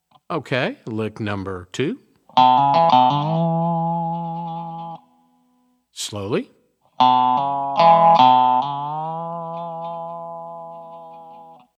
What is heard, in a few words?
resonator pedal, steel guitar